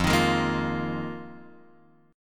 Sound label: F Minor 11th Guitar Open F; Fm11; strummed; x,0,3,3,1,0; JGuitar